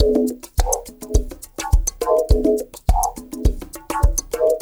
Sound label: VOCO, COW STIX; Spectrasonics; Liquid Grooves 1 & 2; 104 COWSTIX